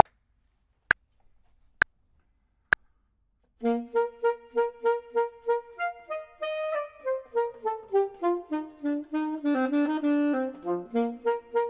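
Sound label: top, Saxophone and Clarinet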